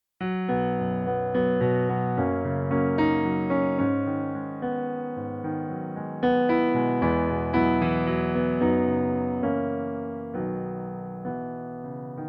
H Dur 3 Strophen; kirchenlied playbackversion

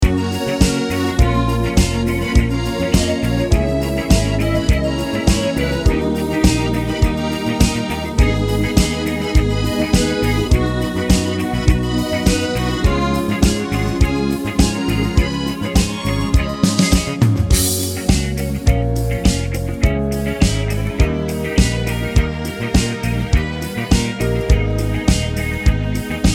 F dur Backings

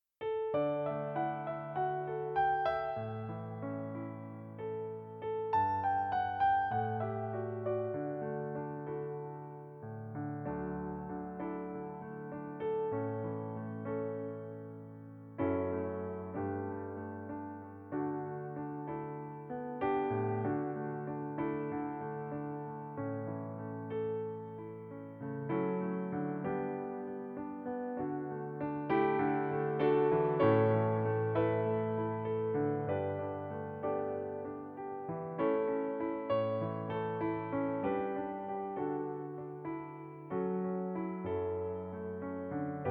pianoplayback D Dur; pianoversion

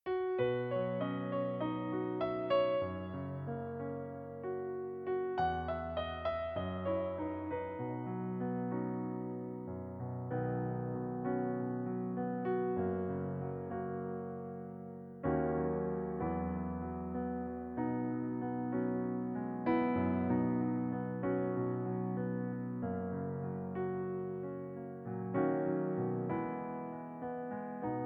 pianoplayback H Dur; pianoversion

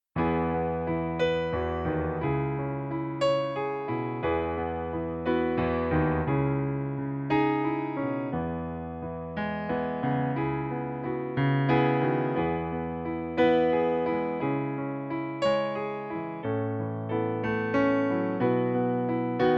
Saengerin Playback, pianoplayback

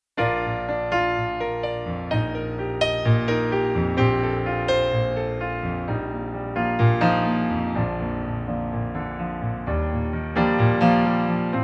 pianoplayback B-Dur

